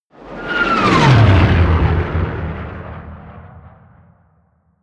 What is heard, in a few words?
flyby